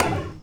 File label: SHUTDOWN, percussive